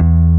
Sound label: bass